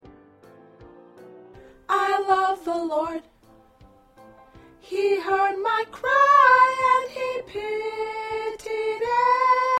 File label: Soprano, choir